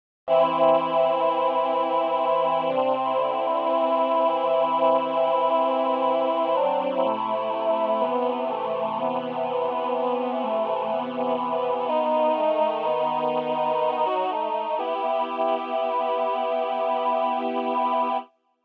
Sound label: Male4 Female4 Ensemble Vplus